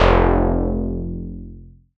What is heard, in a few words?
BAS F1, SCI Prophet-5 synth bass